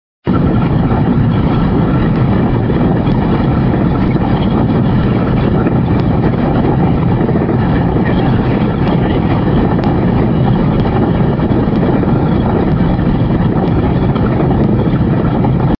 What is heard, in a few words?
Falls, Africa